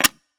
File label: reshka click; wbg games; risk coin